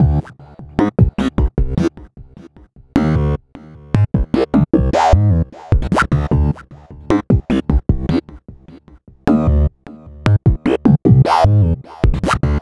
Synth Bass

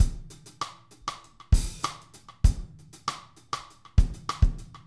98, drum

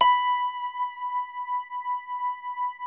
H4, synth pluck; HEAVEN; Music Store - Claudius Diemeter Schwerlos

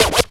Fun Scratch; Proteus 2000; Multisamples; Digital Sound Factory Proteus 2000

